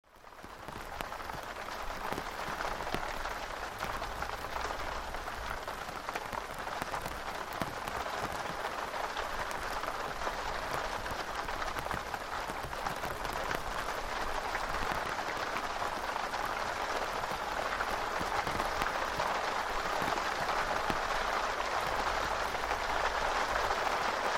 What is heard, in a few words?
Interior of tent with rainfall outside